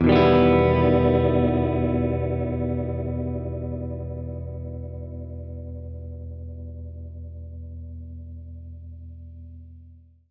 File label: Gretsch Chord Emin[maj7], Guitar Chords; undercover samples